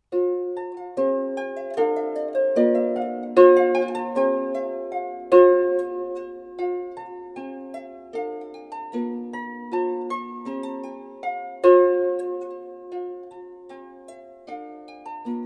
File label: Sweet Bells